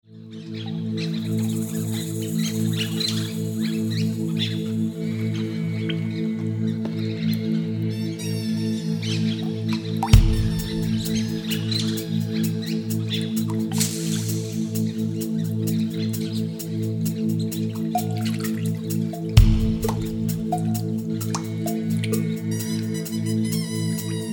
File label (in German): atmo, chillen